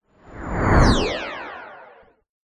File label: cartoon anime whoosh rush power laser